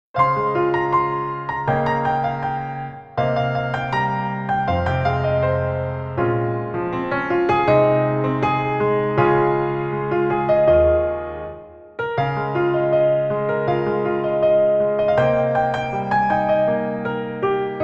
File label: piano arranged ver.; Hanayamata ハナヤマタ